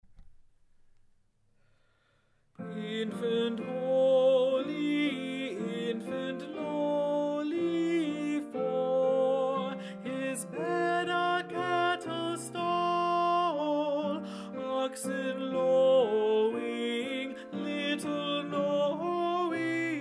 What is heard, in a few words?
Alto; Infant Holy, Infant Lowly, Arr. D. Willcocks